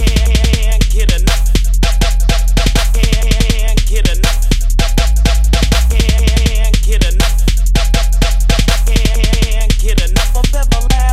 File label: Bass; EverlastinBass